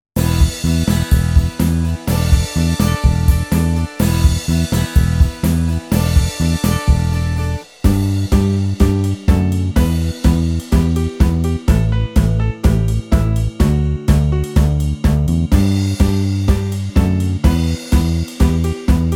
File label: Karaoke